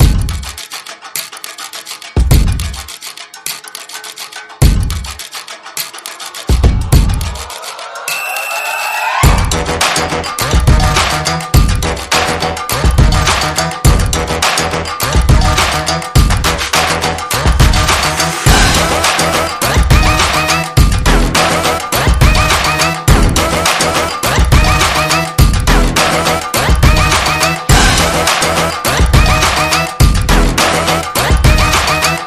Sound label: Party long; Bootflare